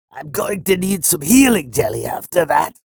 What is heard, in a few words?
pain ability damage; sorlag; low health